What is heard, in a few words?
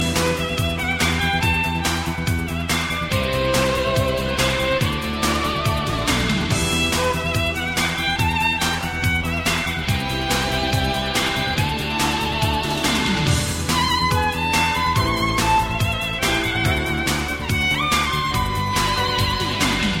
Violin Medley